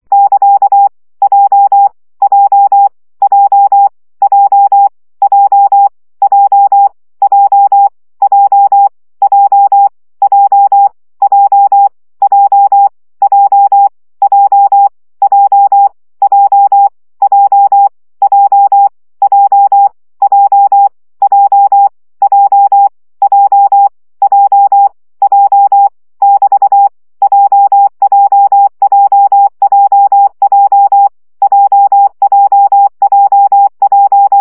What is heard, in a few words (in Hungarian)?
CW gyakorlas j 20 baud